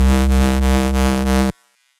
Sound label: Quakezone, Analog Bass; Ableton; Factory Packs; Retro Synths; Analog Bass Vol 1